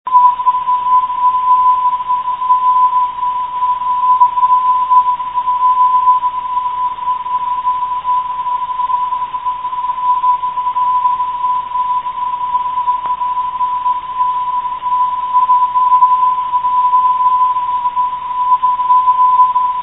at 323khz, NDB

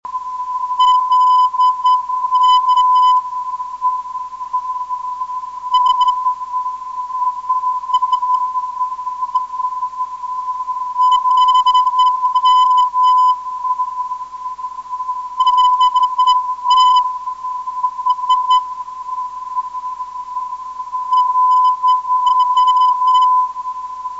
326khz

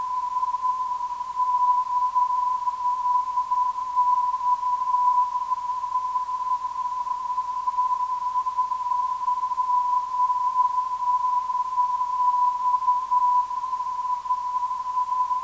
bhx 406khz, ndb

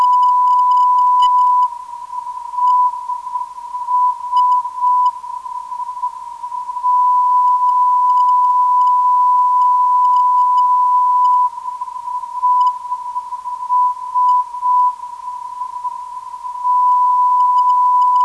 426.97kHz, ndb

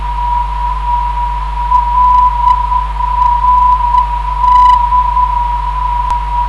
LRD 404kHz

